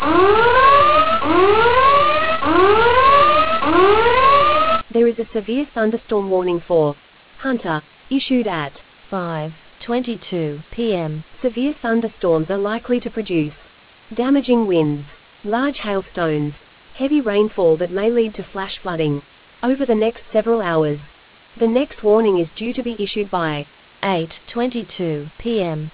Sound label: storm audio